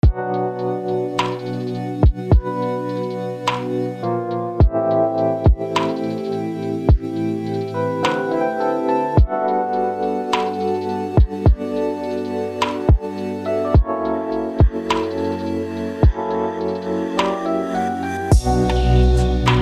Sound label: Old Cafe